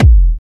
BD Sub; VEC1; Club

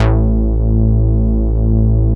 Bass Long A; VEC1; Club; Long Basses

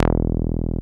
Bass Long E; VEC1; Club; Long Basses

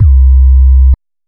BD Sub; Club; VEC1

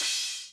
Cymbal, Hip-Hop

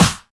Snare; VEC1; Club

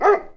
Dog

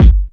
Kick, Hip-Hop

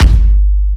Kick; RnB; Takebeat

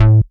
Bass A, Offbeat Bass; VEC1; Club

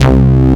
Bass C, Offbeat Bass; VEC1; Club

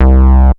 Bass Long C; VEC1; Club; Long Basses

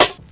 Snare; RnB

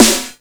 Snare; Takebeat; Club; VEC1

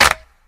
Clap; RnB; Takebeat

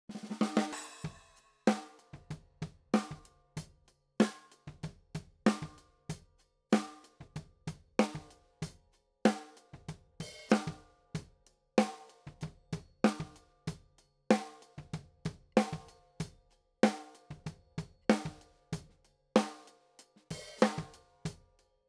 OVERHEAD (Right)

